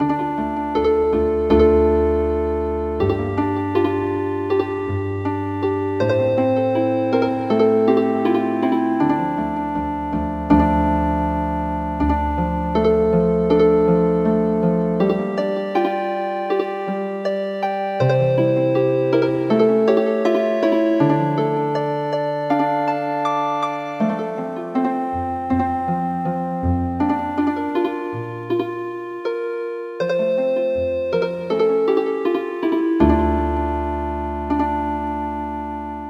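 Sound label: Healing Harp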